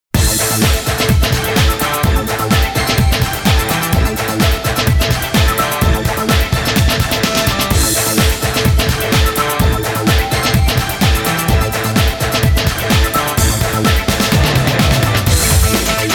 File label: Flying - Intense Fighting; Shin Sangokumusou; kyukyoku ongen